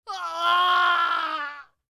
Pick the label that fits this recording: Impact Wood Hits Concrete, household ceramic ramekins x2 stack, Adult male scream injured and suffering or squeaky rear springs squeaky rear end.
Adult male scream injured and suffering